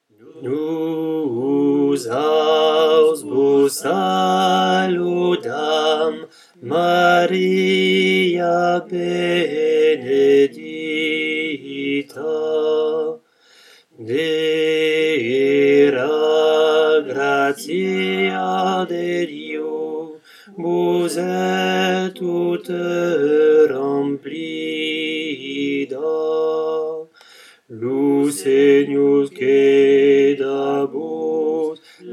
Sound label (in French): basse; ave maria de bareges